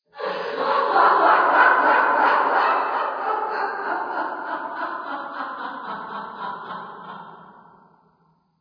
Laughter; Five Nights at the Chum Bucket

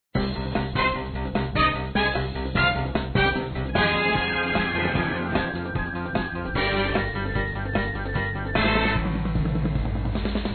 Basic Minigame Music

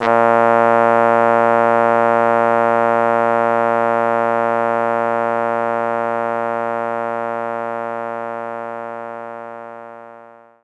trombone Bb2

